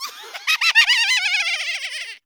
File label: greevil laugh; soundboard